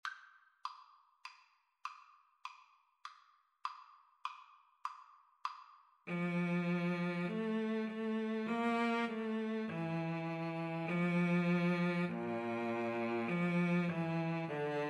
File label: Clarinet Cello Duet